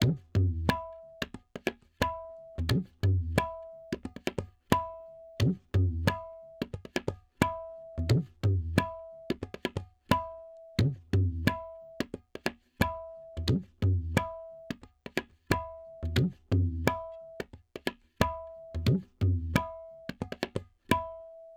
tabla 089, Hip Hop India 089bpm; Hip Hop India Modern Percussion

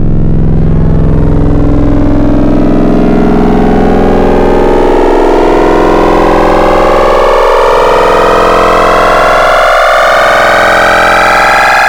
FM Table, FM Wavetables; Cymatics; SERUM

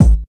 Kick A Hit, Bmin; Hip Hop; Hip Hop Exotica; Apple Loops; Big Fish Audio

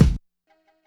Kick

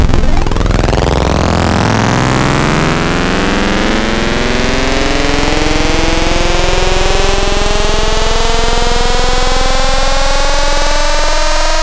Harmonic Turntable, wavetables; Cymatics; Wavetables Collection Vol 3; Serum

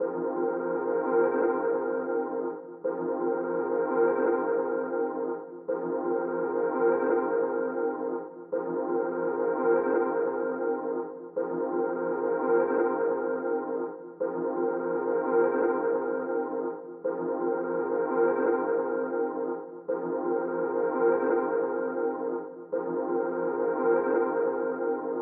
169 BPM F Min Texture; Cymatics; Twilight; Destiny Gold Expansion

